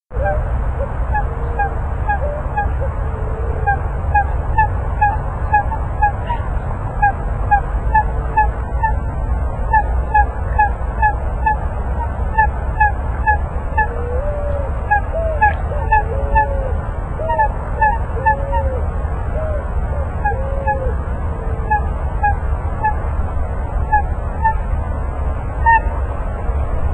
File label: Great grebe